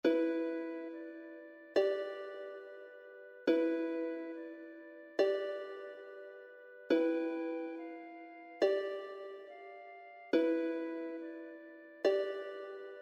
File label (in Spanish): Quietud 2 liras